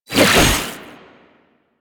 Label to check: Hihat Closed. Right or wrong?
Wrong